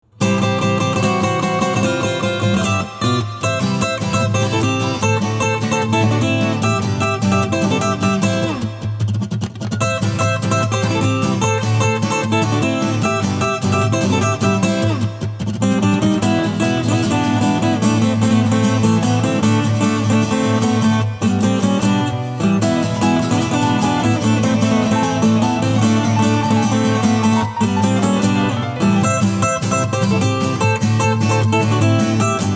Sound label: Character Select Acoustic Short; SMB2